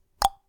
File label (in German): Schnalzen